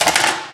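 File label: physics clipboard impact small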